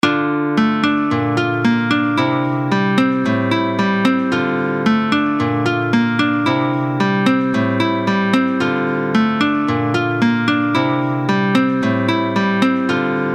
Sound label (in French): guitare